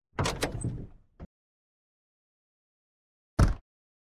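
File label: door handles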